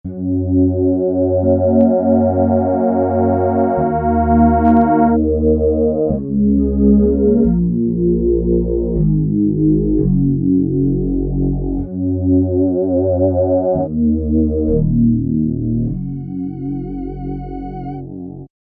Slow Wa, Peavey DPM Spectrum Bass

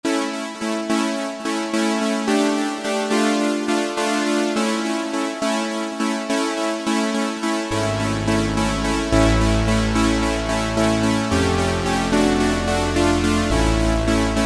Strings; Cheetah MS6

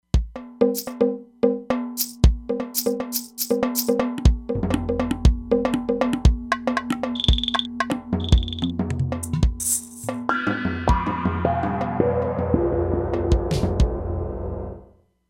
Panned Perc, E-mu Proteus-3; Factory patches

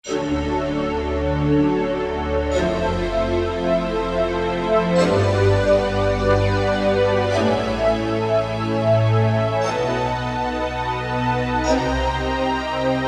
Bell Pad; Generalmusic Equinox

